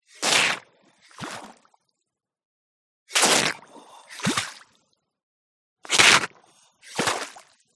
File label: yabby pumping super suction; alexander gastrell